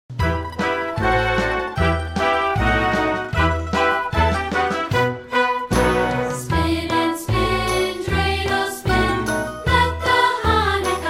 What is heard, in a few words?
dreidl spin